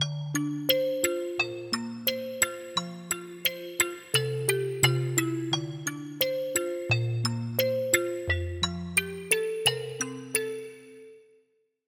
Bell

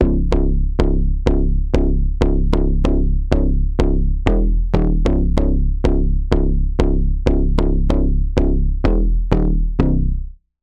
Bass

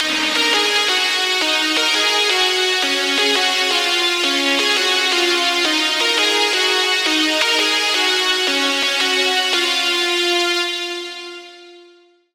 lead; red sky; Routed Sound; Serum 2 Expansion - Flame Summoner Vol.1

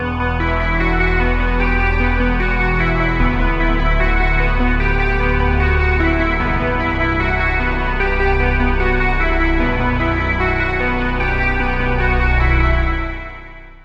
Key; Routed Sound; Sylenth1 Expansion - Reality Maker Vol.1

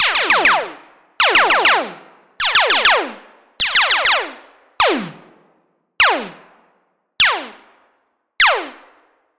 SFX - Laser Gun Shot; Routed Sound; Vital Expansion - Hyper Gamer Vol.1

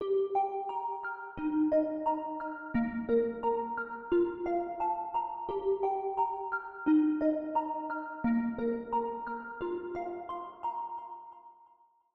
Pluck; Routed Sound; Sylenth1 Expansion - Flame Summoner Vol.1

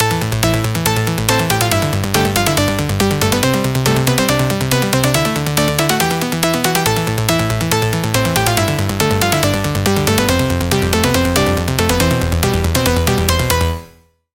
Pluck; Routed Sound; Sylenth1 Expansion - Hyper Gamer Vol.1

